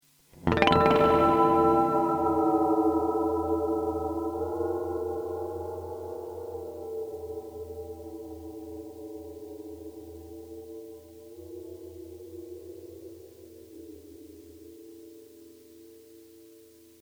EarthQuaker Devices Ghost Echo V3 Reverb Oszillation